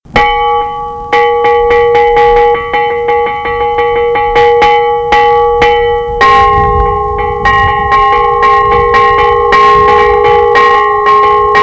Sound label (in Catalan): campana